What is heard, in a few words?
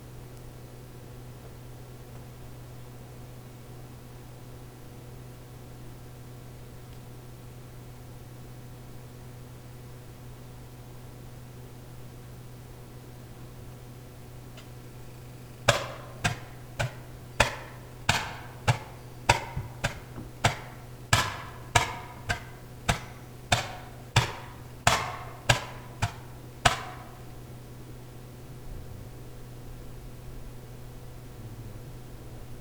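wood knocking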